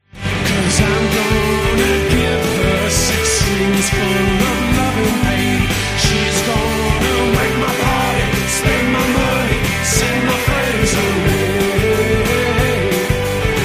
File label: guitars six string